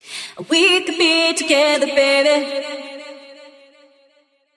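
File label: pure analog deep house loop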